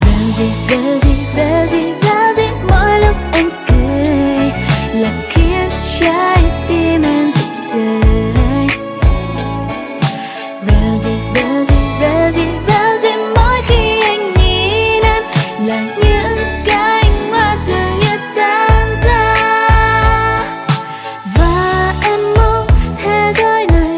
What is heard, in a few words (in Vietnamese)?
nhac chuong